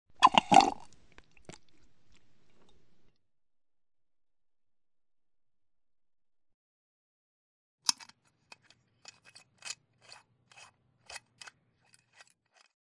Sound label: sit drink; Commander; barker; Titanfall 2